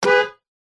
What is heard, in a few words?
vehicles car horn short honk interior nissan patrol